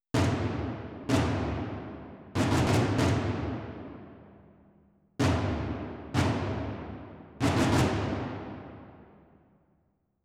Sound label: toms; combat results win; Netease